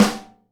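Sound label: snare bottom DAMP, Live Snares; E-MU Producer Series Vol. 8 – Platinum Phatt (CD 1)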